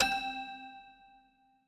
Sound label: toy piano